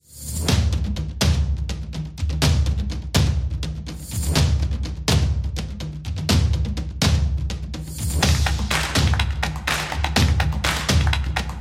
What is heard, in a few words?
A Drumming Sport; energetic drumming